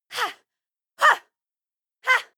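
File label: female war ha short, screams; menschen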